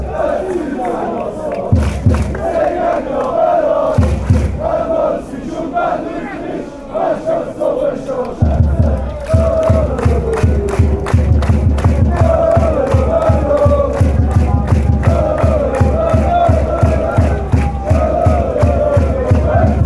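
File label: verse drums, football chants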